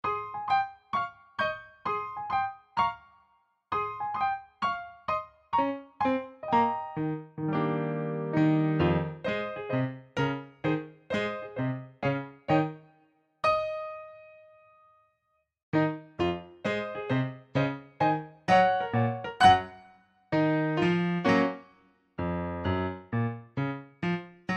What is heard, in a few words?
ACCOMP.